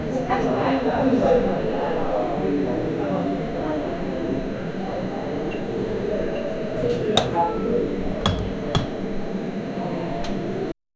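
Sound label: warm cozy coffee shop ambience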